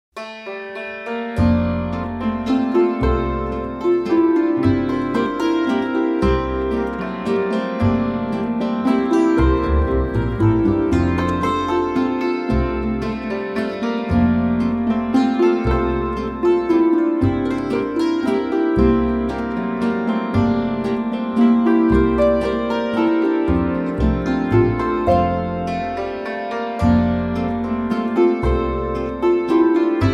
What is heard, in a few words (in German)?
Halbwalzer